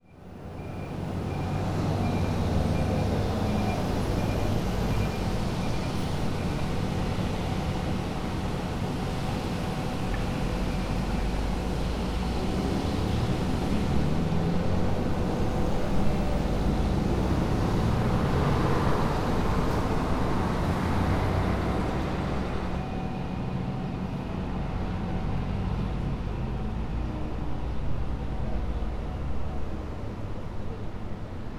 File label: route 4 decontamination